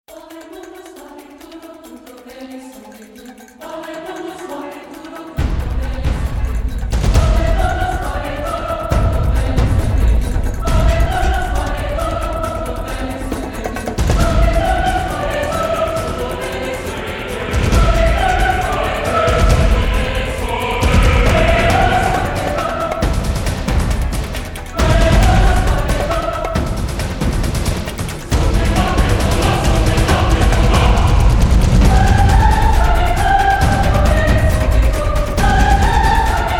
drums and choir

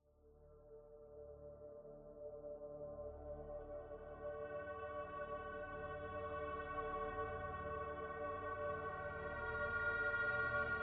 ambient

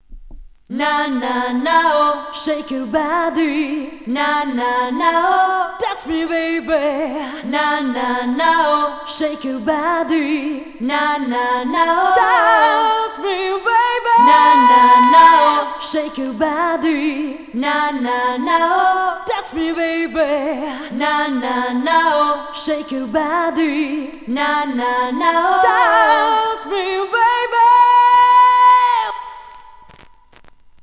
acapella

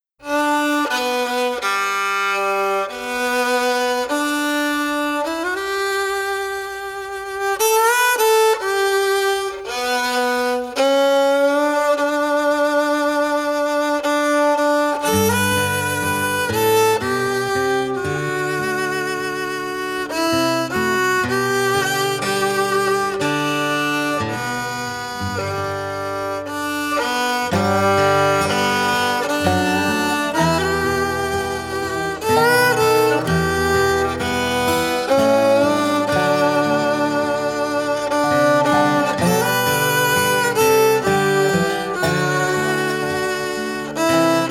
star spangled banner instrumental

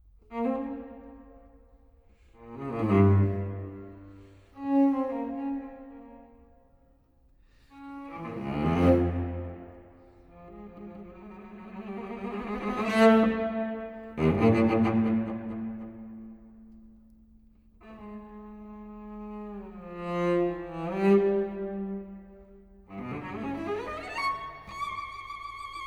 suite for solo cello recitativo fantastico; benjamin britten; no 3 op 87 1971

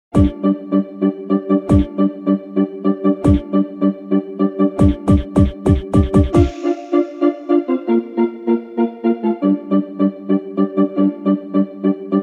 instrumental rain remix

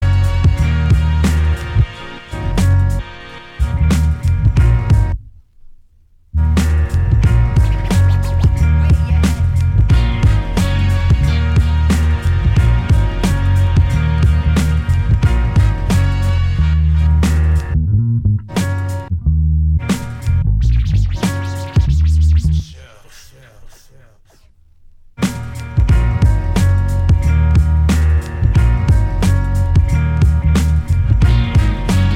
hip hop instrumental

